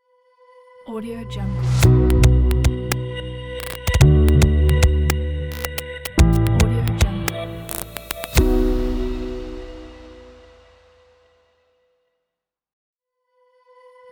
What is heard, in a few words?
clean tech glitch logo; bestsfx